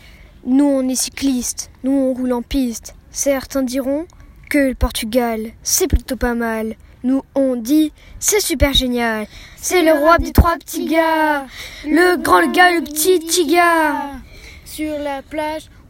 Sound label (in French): rap